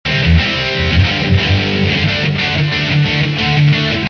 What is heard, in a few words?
D 120 rhythm